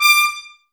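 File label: Brass trumpets D, Mute; Miroslav Vitous - Woodwind Brass Ensemble; Tps Mute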